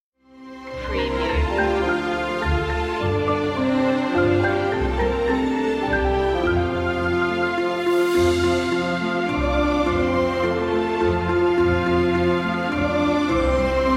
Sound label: orchestral; the holly and the ivy; media music now